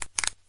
skel, Skeletons; Blud Mage; StudioFreresChasseurs

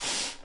shirt

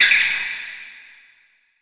bat spawn; Blud Mage; spawn the bat

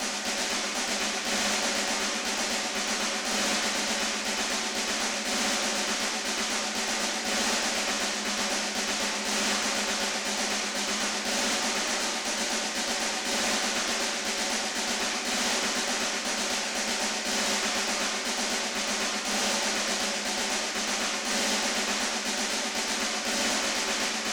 School Snare

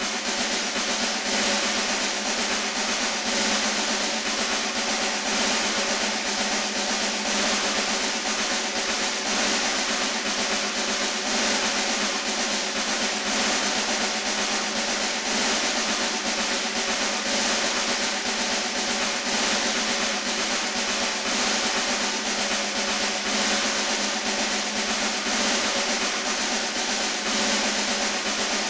School Snare

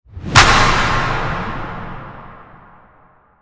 Mega Slap; First Person Slapper

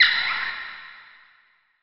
bat spawn; Blud Mage; spawn the bat

